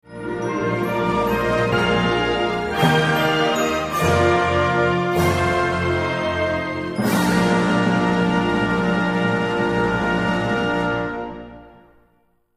win jingle; Britain

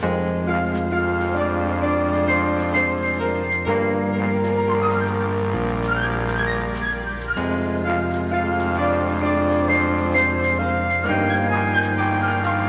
Loop 130bpm; Mozy; jenny leclue detectiv

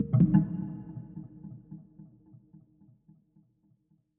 Tribal Omni

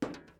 metal step

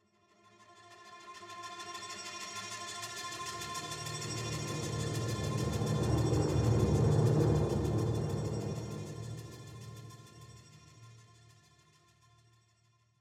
Spooky Ship Mood

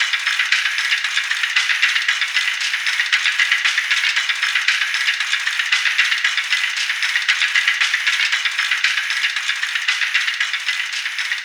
Ambience Machinery; Splatoon 3

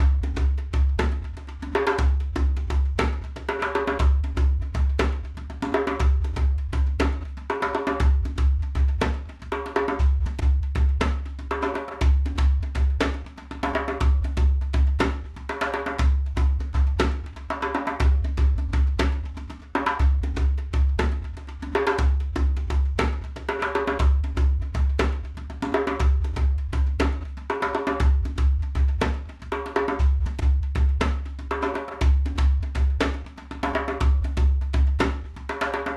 Djembe; raji an ancient epic

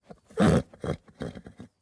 horse grunt

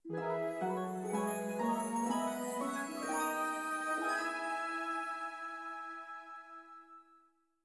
music sting ballout bonuses; My Little Pony

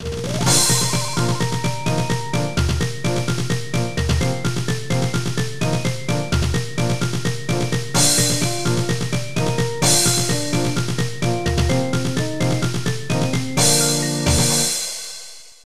Map Screen; The Age of Heroes: Silkroad 2

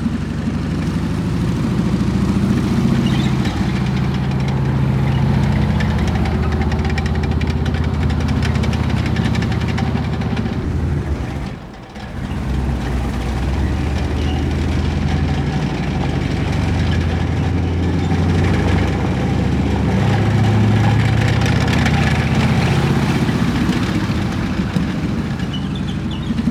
vehicle tank drive

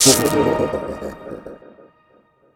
CutScene Transition; high hell

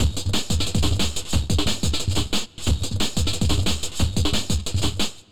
God Mode Intro Drums; Helios

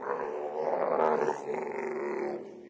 Sfx - Llama Man Panic

